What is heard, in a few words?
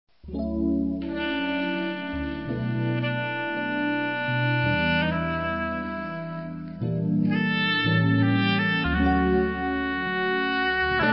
Lounge Music